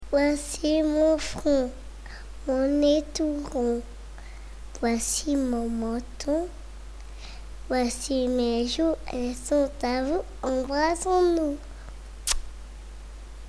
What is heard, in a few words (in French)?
comptine caline